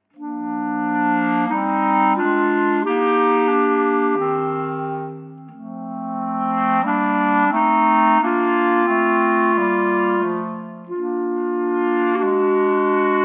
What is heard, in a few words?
Clarinet Trio; beginner clarinet trios